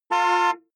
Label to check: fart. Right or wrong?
Wrong